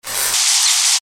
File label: future electric arcing and swoshes